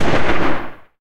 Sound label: 12mm tails close, guns tanks